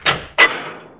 Mecanismo; Grounch

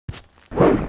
Soldado Golpe; Dinamic Multimedia 2000

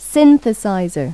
SINTETIZ; Pipo-Aprende musica con Pipo

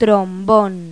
TROMBON; Pipo-Aprende musica con Pipo

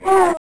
Trompeta Toke; Revista CD Expert 42; Grounch

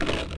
M paso astillado

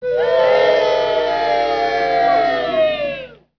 abucheo; efectos

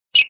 boton; Ataque de Esporos

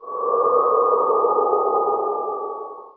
Goteo peq; Grounch

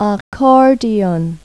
ACORDEON; Pipo-Aprende musica con Pipo

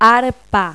ARPA; Pipo-Aprende musica con Pipo

